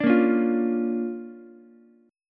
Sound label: D-Minor Major 7th Sharp 5th-D-down-Ukulele; DmM7#5 strummed; JGuitar